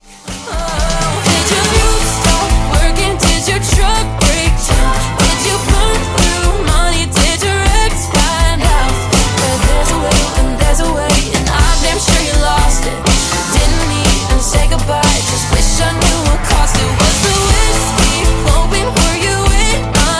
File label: 122bpm Am